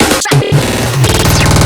FILL, PsyTrance

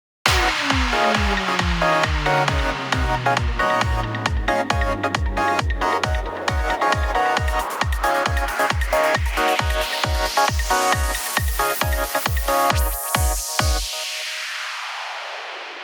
inverted RAINBOW, Instrumental

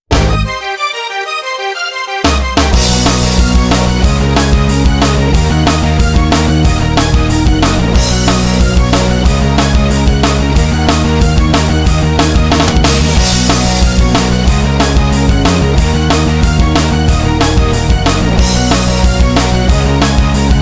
instrumental

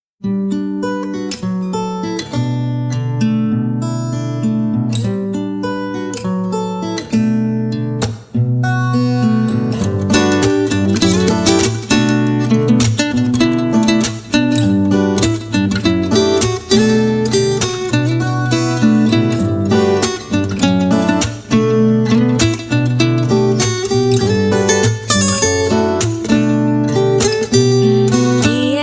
Acoustic Ver.

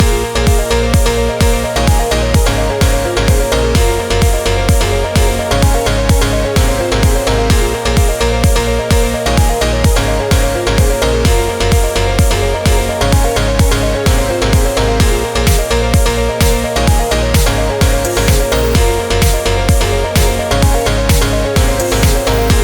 pianostyle

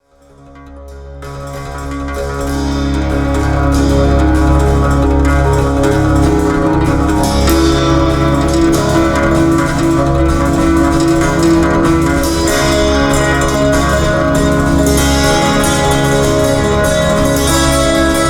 Ukrainian Folk; Ukrainian Folk for ''Ten Desires''

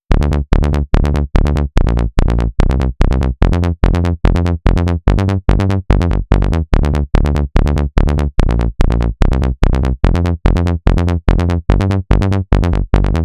Bass Fullon, PsyTrance; LiLA'c Records PsyTrance Sounds 01

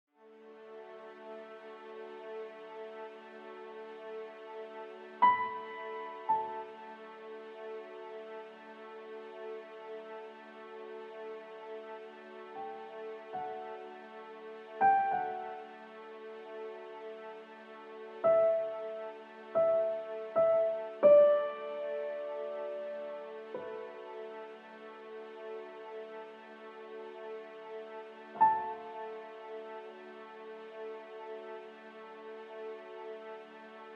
piano music

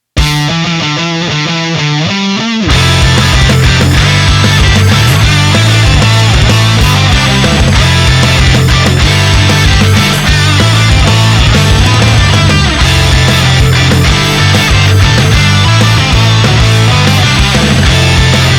off vocal

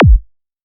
Kick; LiLA'c Records PsyTrance Sounds 01

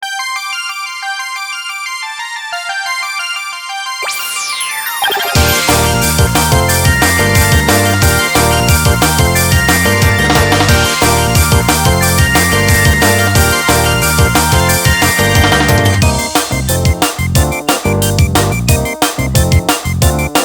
instrumental

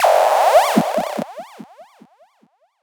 Zap, PsyTrance

